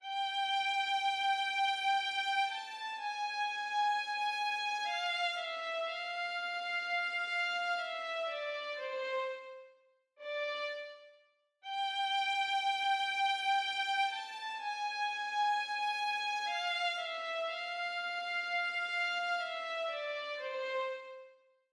violins

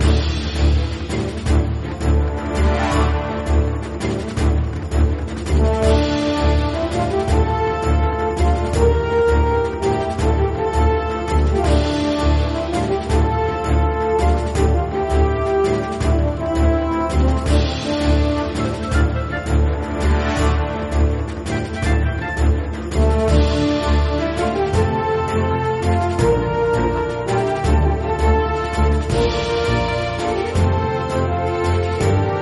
combat; musique

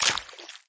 sploutch

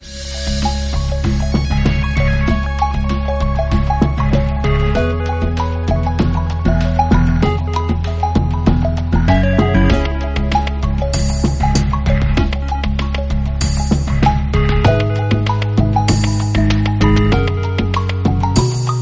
jungle

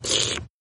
slurp

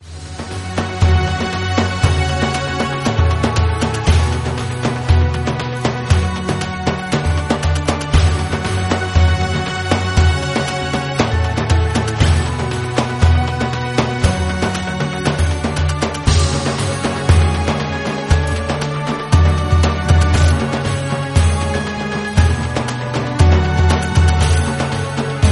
desert, musique

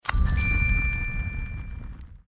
bonus

